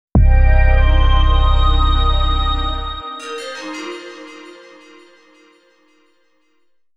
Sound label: Jingle, Title Call; Fate Tiger Colosseum Original Sound Track [Complete Edition]